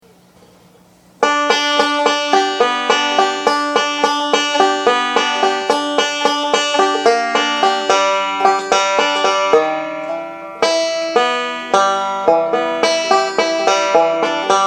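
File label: easy version, banjo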